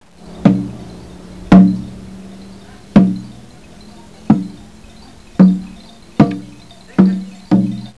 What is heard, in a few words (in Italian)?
suonerie musica ritmica